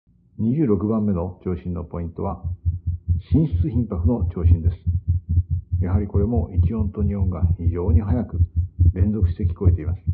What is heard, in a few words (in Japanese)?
VT 心室頻拍